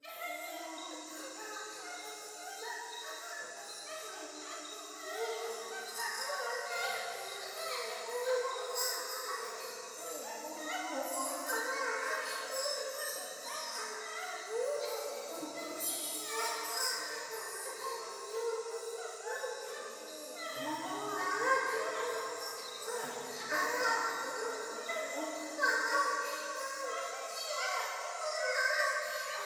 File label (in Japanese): トイレ 喘ぎ 山奥 夜